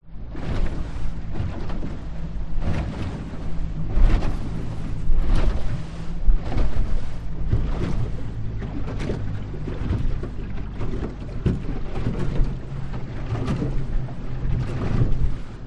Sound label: transport motorboat driving sea choppy waves slow recorded close to floor hull